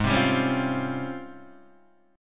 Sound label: G sharp Major 13th, Guitar, DADGAD